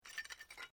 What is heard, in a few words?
Glass move quiet; Material components for constructing more complex sounds; Materials